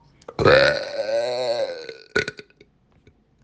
tosse lenta

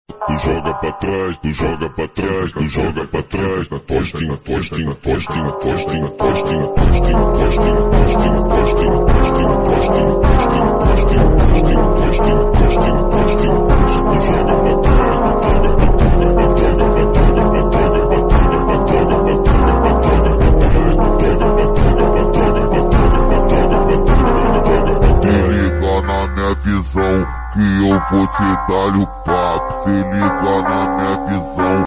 beat magico

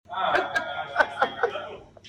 risata goliardica

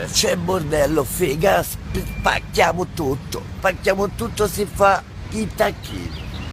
tacchi

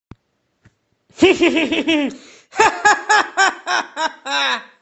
risata

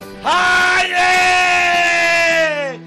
urla

